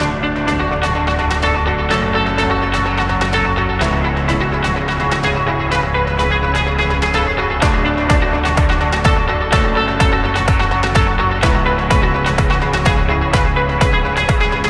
Epic Corporate; corporate beat

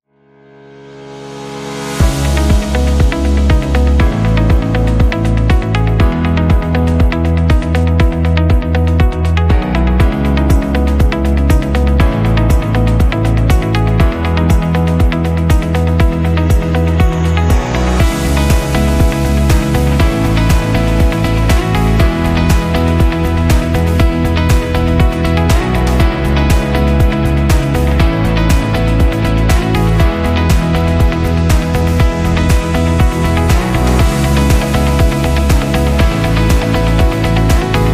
Corporate Presentation

